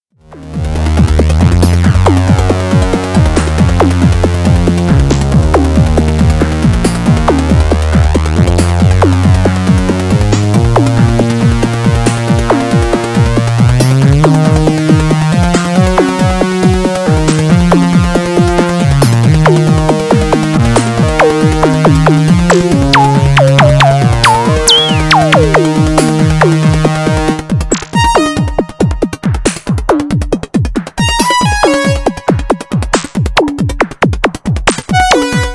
6 Tracks FX and Lead, synthesizer; GRP A4

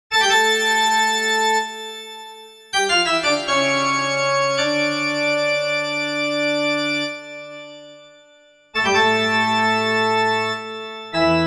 s3 church organ

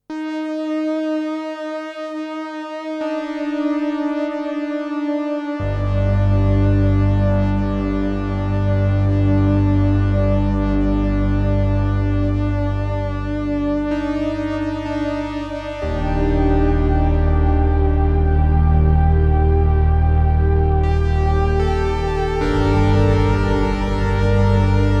Trident Soft Chords

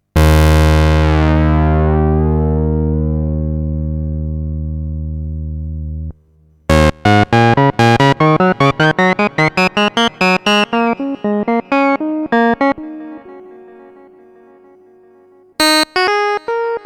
Bending Sync Solo; GRP A4

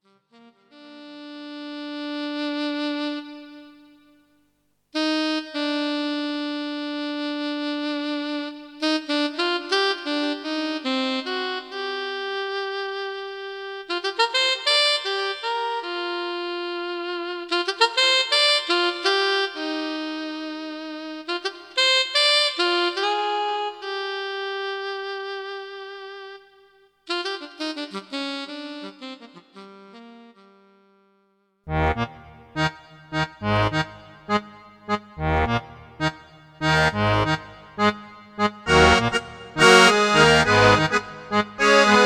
sax accordeon

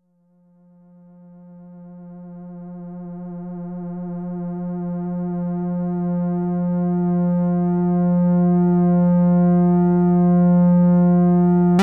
PWM, synthesizer; RetroWave

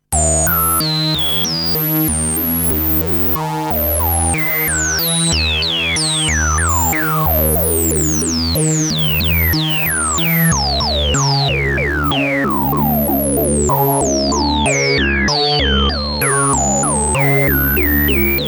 Filter Resonance, synthesizer

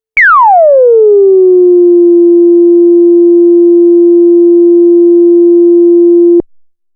Self Osc VCF, synthesizer

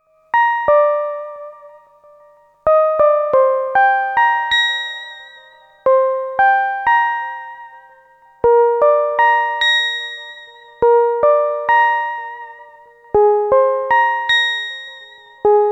Jupiter 4 bell

